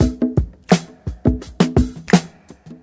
85 bpm drum loop sample b key

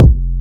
warm sounding steel kick drum sound F sharp key

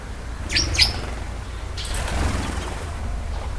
swallow bird flappig her wings; soundscapes

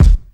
phat kick sample e key; kick drum samples

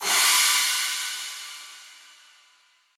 drum crash sample d key

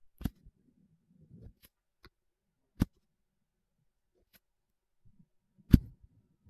anti static vinyl brush; perc

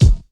dry kick drum d sharp key

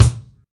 rich top end steel kick drum sound a sharp key

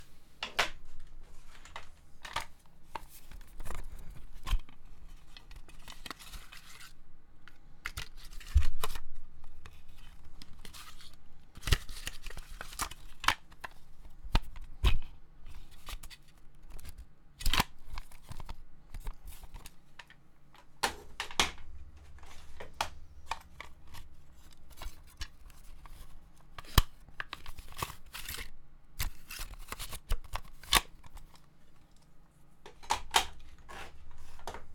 audi cassettes play; compact cassettes tape case

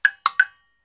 short wooden block sample; percussion; loops

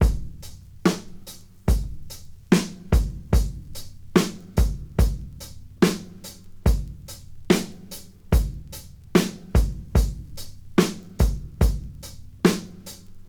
72 bpm breakbeat sample g sharp key; drum loops

